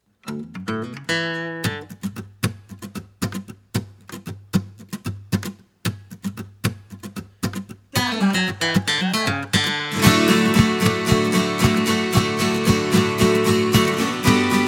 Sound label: forest acoustic instru